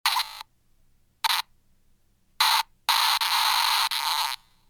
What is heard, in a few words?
wiggle bar spazz